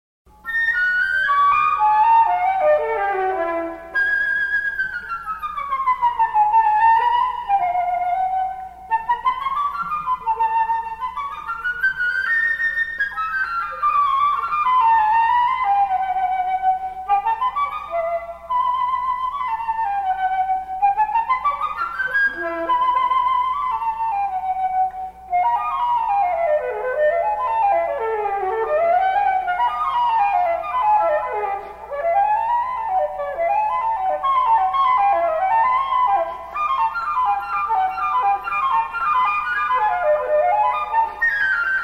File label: flute